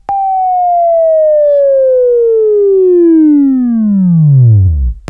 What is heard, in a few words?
Buzz, Left; Sweeping Training